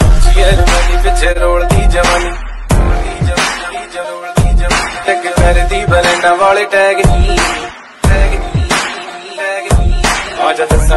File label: bollywood mix punjabi ringtone